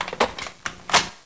m249 boxin; cstrike

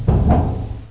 duct, player; cstrike

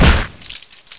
headshot, player; cstrike

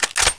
p90 bolt pull, weapons; cstrike

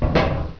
metal, player; cstrike

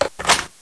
ump45 clipin; cstrike

